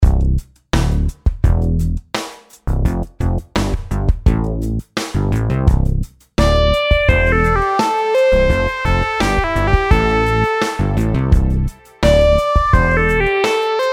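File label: melodies simple 8s triplets C major; melody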